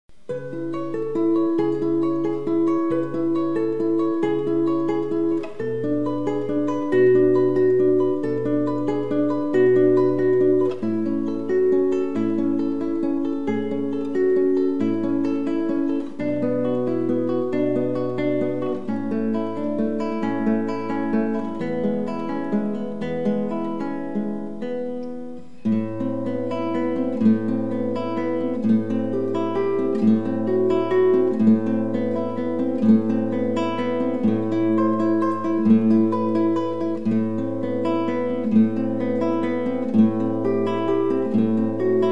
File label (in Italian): carillon